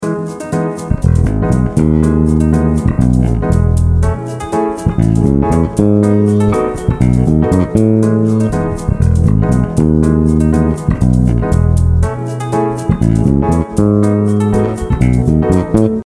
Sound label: Emajor, Ema7 for two bars, followed by Ama7 and B7 for one bar each, with the bass outlining each chord; a bassist's guide to chord substitutions